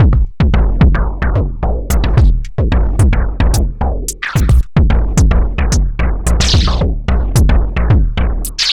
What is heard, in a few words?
groove boy 110bpm